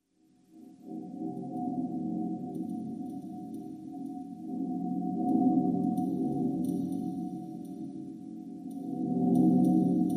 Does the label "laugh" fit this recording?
No